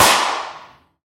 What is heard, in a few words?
classic gun shot; soundscrate